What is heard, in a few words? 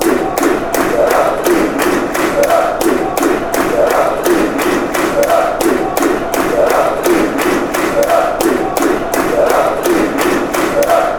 chant; charlton